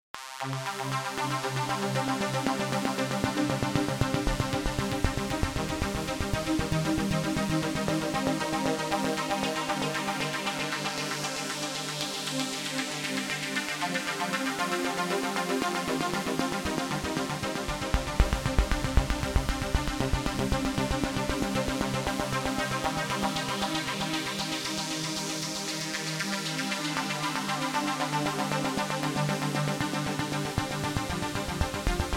super soft pulse arp; pro12

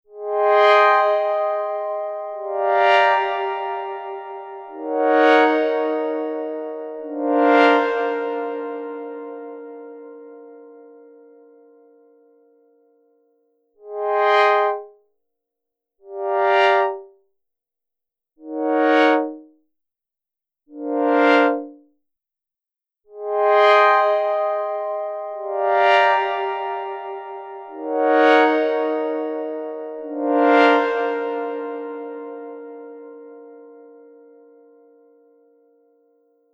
SP2016, synth swells

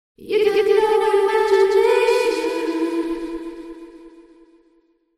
somber tunnel delay wet

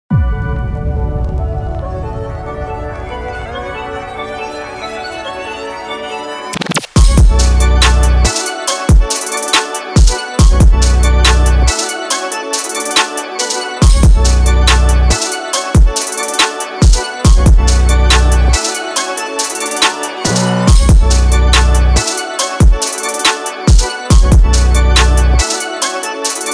tectonic trap

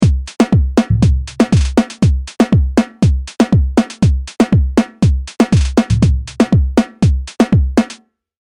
serum drums; chiqui audio

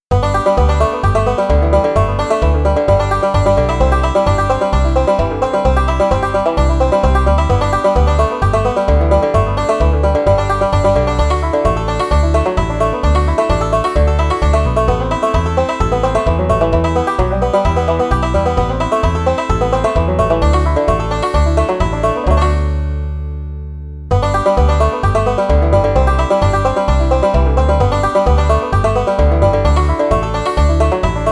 pickin and grinnin

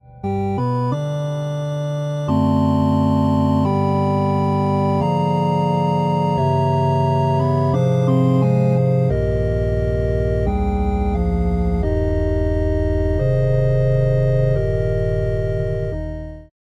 chapel organ

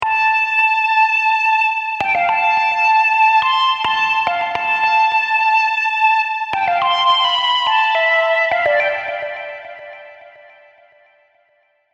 serum synths; chiqui audio

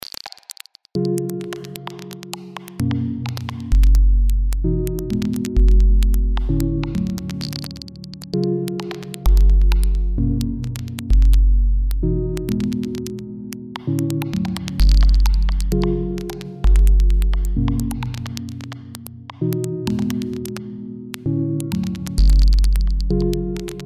ambient glitch

